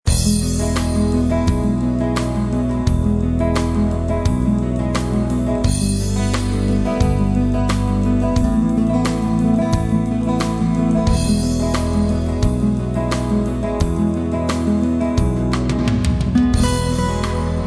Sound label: light rock